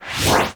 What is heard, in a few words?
Cast; Warframe; Ninja